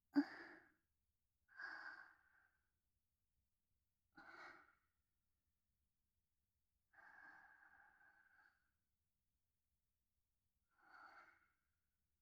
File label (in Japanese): 寝息; SEなし